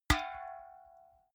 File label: big can empty hit hard